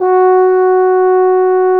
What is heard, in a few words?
tuba loop note